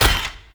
Melee Step; Warframe; Necramech

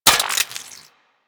Spear Gun Reload, Weapons; Warframe; Corpus

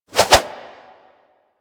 Staff Swing Spin B; Warframe

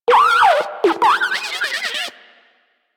Moa Compassionate Vocal Cold Shiver; Warframe; Pets

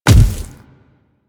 Infested Splitter Step Default, footsteps; Warframe; Grey Strain

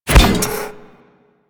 Step Default

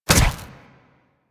Drill Flame Burst; Warframe; Grineer Ghouls; Ghoul Drill Sergeant; Attacks

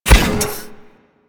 Step Default

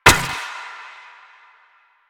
Updated Shot, Weapons; Warframe; Moa Pet; Multron

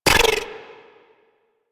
Sniper Rifle Layer; Wraith; Grineer

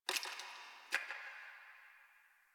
Ice Crack One Off; Warframe; Ambience; Nineteen Ninety Nine; DE

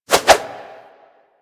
Staff Swing Spin D; Warframe

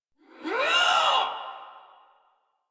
Attack Effort; Warframe; Dax; Ranged

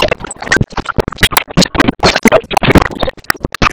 Object Temple Defense Wave Clear

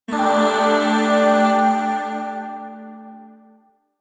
Zariman Void Shawzin Pent Maj Chord

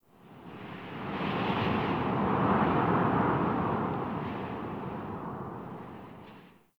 Wind Heavy Fast Gust; Ambience; Dungeons; Under City

